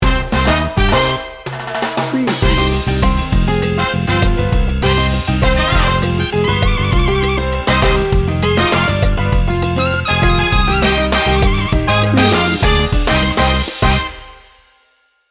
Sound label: salsa